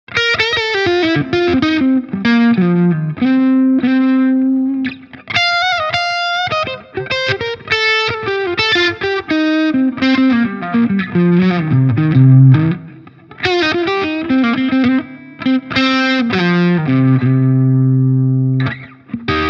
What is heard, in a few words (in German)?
Hals crunch